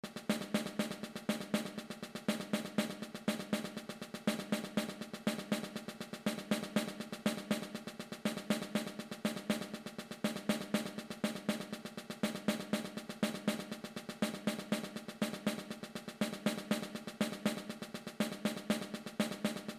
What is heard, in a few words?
snare